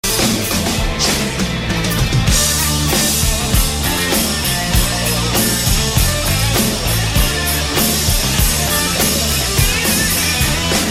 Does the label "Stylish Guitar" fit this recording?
Yes